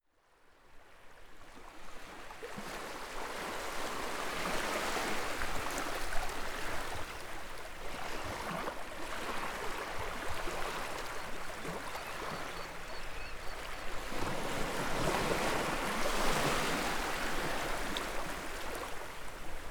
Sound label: ocean waves; Ocean, Carnac.